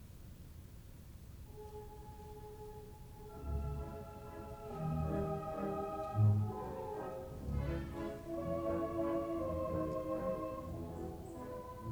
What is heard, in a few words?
Waltz in C minor; Chopin